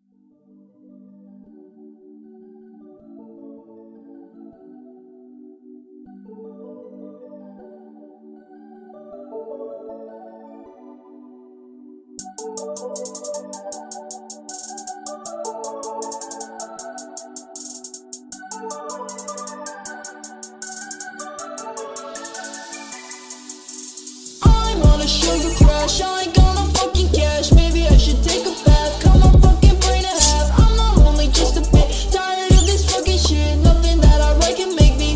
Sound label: slowed reverb